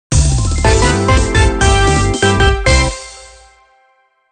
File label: Music Success event